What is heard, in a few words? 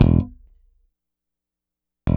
Rock, Bass; Bass X